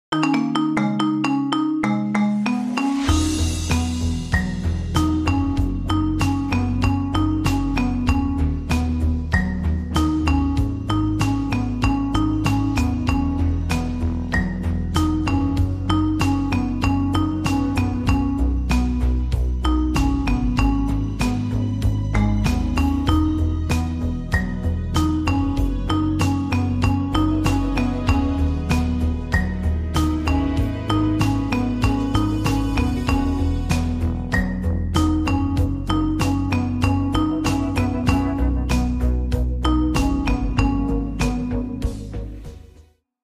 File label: marimba